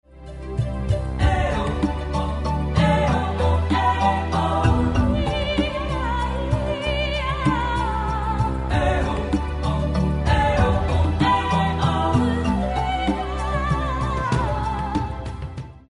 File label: Ringtone